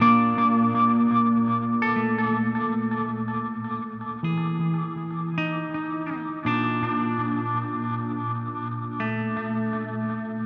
MXR Carbon Copy

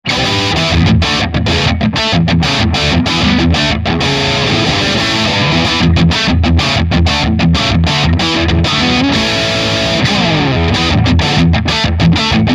Heavy Crunch

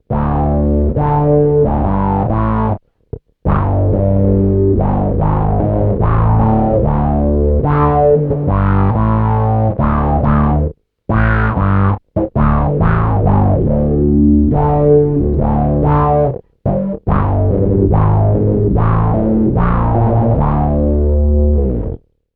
Moog Taurus

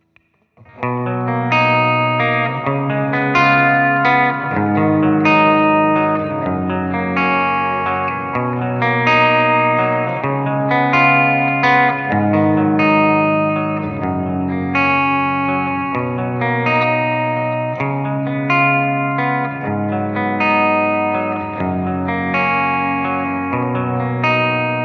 clean line; Preset Beauty

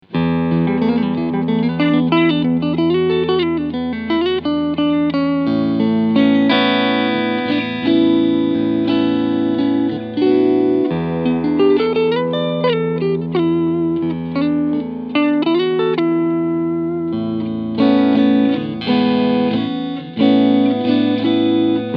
FGN Boundary Odyssey Cleansound Front Singlecoil

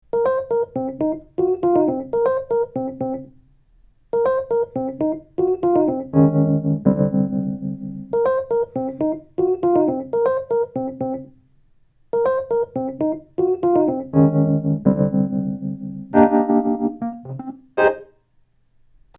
Calima Rhodes High Gain

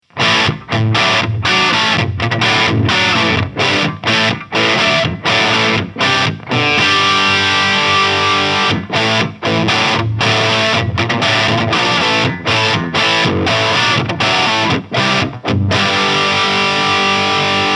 Cort KX508MS II Crunch; Marina Blue Burst

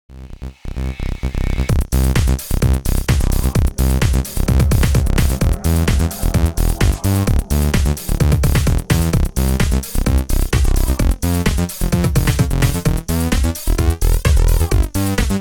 Flanger

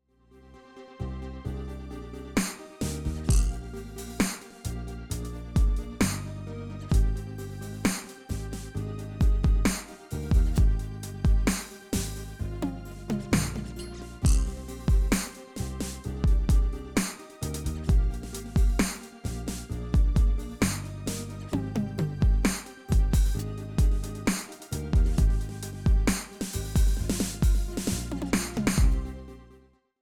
Millenium MPS450 Backing

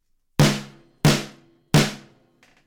Snare

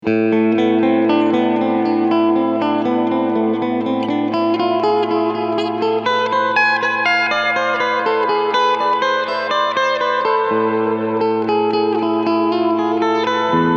Plate tone open

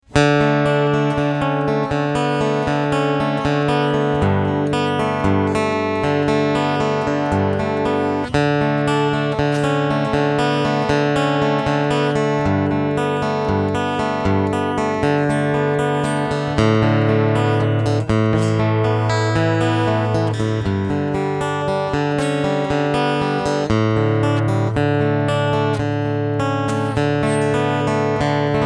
Epiphone J-45 Picking EQ Full Treble; November Burst

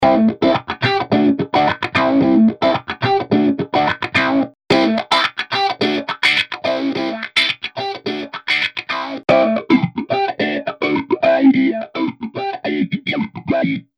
gr wah

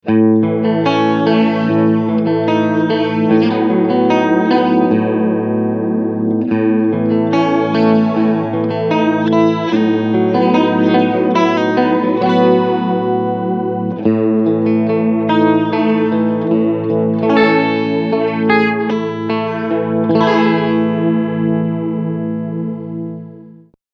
Preset Univibe

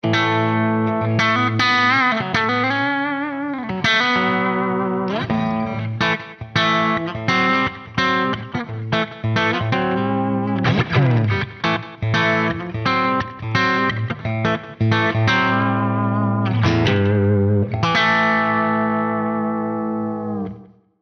Neural DSP Soldano SLO 100 Gain

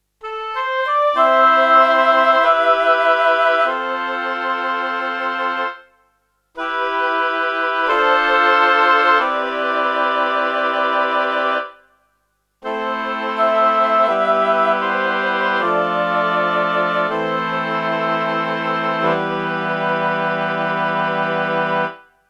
Orchestra

